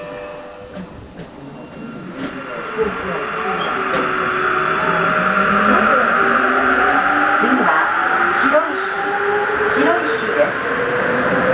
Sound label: 8000kei hitachi, train motor sound